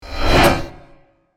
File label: swipe; tyrants edge